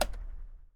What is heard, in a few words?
electric fan off